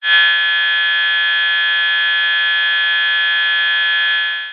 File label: science fiction radio static interference harsh buzz